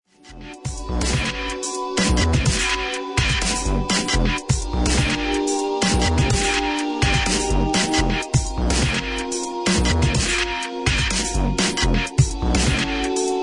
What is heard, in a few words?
AMBIENT EXPERIMENTAL